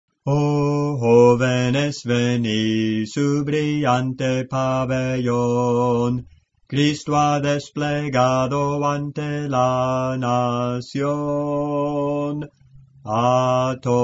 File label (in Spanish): Contralto, Cantos Espirituales; 020 ¡Oh Jóvenes Venid!; Clase del Canto